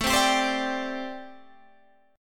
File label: G Suspended 2nd Suspended 4th